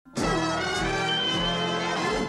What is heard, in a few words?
transformers transition; transformers spin